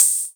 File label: Open Hihat; Best Service; House Definition VOL-1; Cymbal Stuff